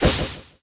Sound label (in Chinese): Tank girl punch, 女孩形态捶击音效; 攻击音效 atk; 坦克; 海岛奇兵; 坦克女孩会用拳头殴打对手